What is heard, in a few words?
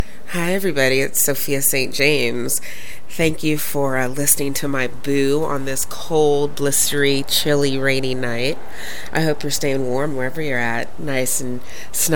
warm up